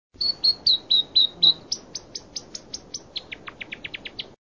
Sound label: north island robin